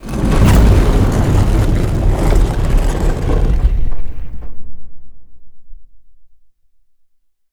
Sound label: archeology event big door opening; ancient relics